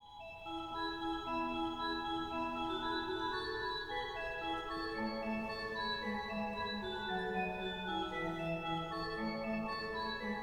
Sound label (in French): concerto pour orgue; Handel; HWV292